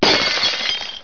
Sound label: SMASH; Simon and Schuster Interactive